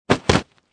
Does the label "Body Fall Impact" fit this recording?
Yes